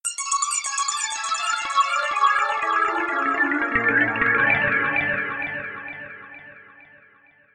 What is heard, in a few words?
arpeggios hyperarpeggio; zasfx